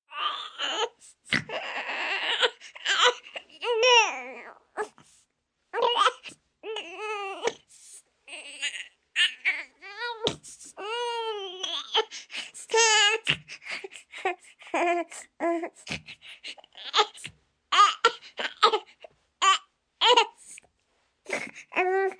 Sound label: Baby Fussing, Baby fussing, 2 months old, long, snort, human voice, kid, child, infant; Talking